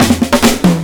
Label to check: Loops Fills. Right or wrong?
Right